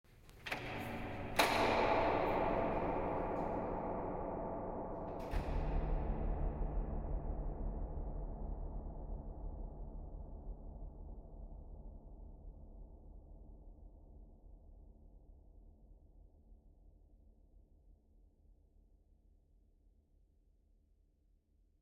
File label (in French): porte cathedrale